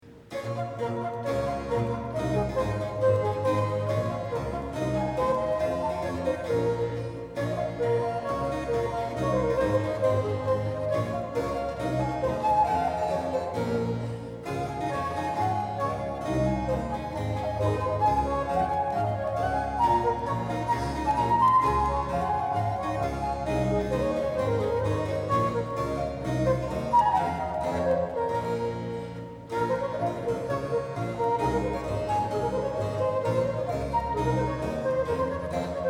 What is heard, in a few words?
Sonata for flute in b minor, Piu Allegro